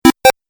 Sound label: 8 Bit Style Music Accent; Video Game